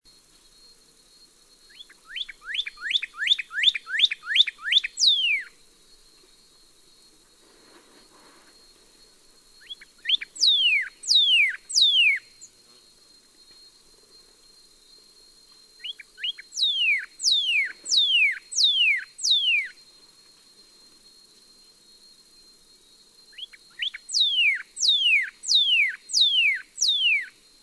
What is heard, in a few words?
Cardinalis cardinalis